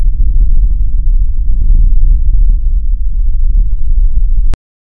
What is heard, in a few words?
earthquaque; disaster